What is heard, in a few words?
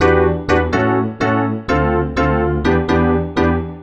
E PIANO 125, Keys; Houseworx